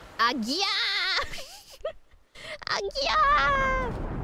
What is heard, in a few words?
ah giyaaaaaah